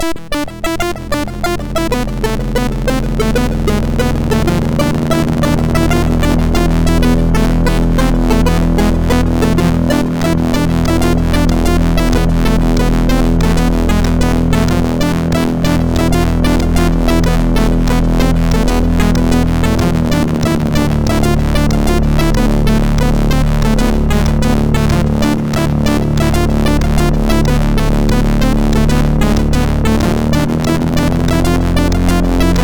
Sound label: sid MOS6581R2; Phoenix Code tune 1